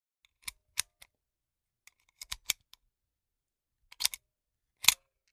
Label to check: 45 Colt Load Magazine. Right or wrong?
Right